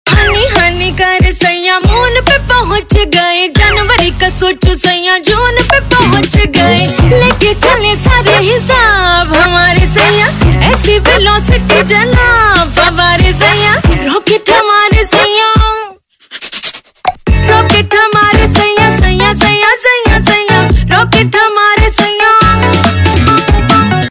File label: Ringtone, Bollywood; Shubh Mangal Saavdhan